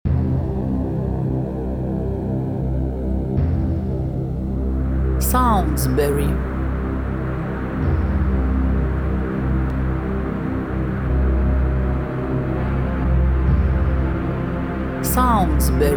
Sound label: Horror Secrets